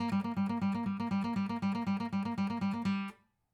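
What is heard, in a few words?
acoustic pattern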